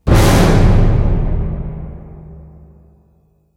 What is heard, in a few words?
Orchestra Hit